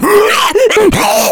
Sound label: rage, infected, male